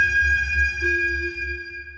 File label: sonar Tail Water Far Shuttle; Barotrauma; Real Sonar